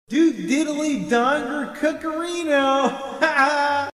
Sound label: haha